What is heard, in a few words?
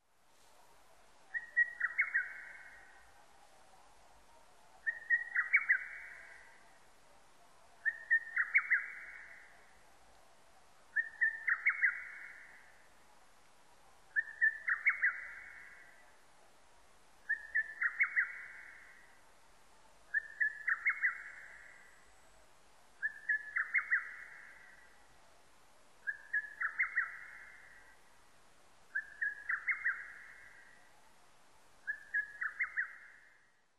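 hototogisu, lesser cuckoo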